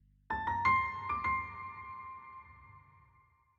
intro jingle 200bpm; finalboss phase04 a; chicory a colorful tale; ps4

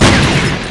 Tank

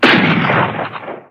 Ambience Gun Shot

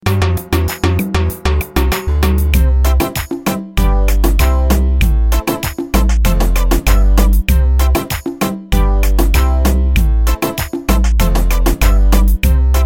Victory Reggaetron

